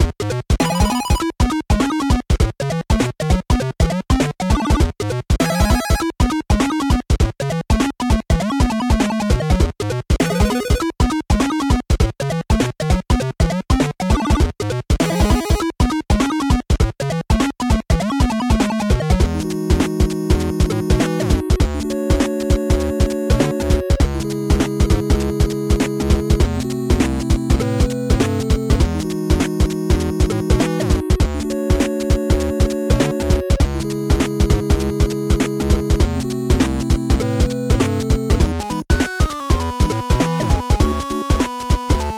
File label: Tavern Song Loop; bat boy; ps4 ps5 switch windows xbox one xbox series xs